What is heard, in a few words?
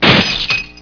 GLASS